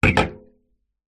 food drink can soda full down on wood surface; zapsplat

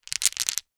stones pebbles handle; foley; zapsplat

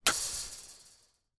impact object hit metal fence wobble

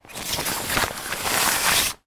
jacket raincoat arm into sleeve; zapsplat

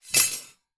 household handful cutlery drop carpet floor

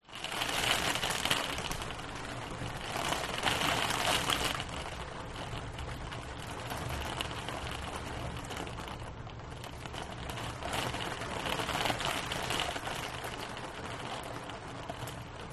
rain wind blow rain against window drips

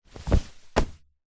household pillow throw hard onto bed

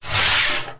money coin slide on metal table; foley; zapsplat

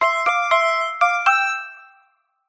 multimedia musical tone goodbye logout; zapsplat

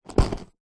skateboard mini board plastic set down; sport

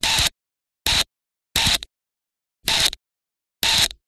technology cb radio interference clicks glitches

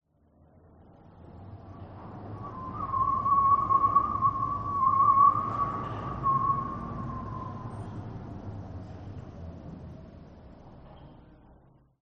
Creepy Howling Wind Interior; west wolf

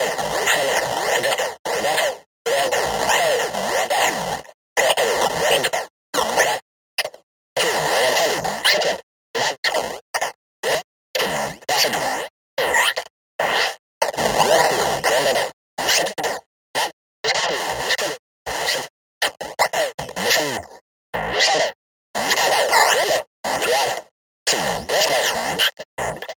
Static Radio Glitches; bottlerocket

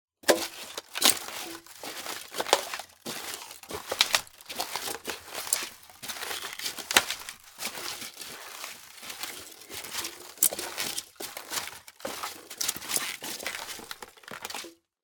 Footsteps Male - Boots Polystyrene

